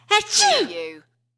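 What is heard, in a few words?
Female Sneeze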